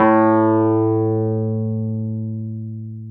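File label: Wurlitzer electric piano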